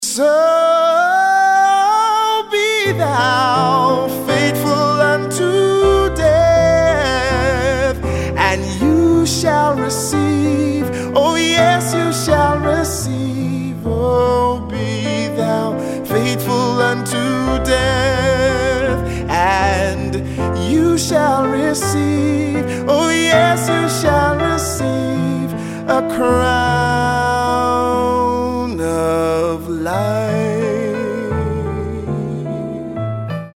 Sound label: ring tone